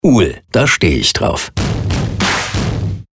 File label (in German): Sound Logo; Audio Branding – Akustische Markenführung – Sound Logo – Audio Logo; UHL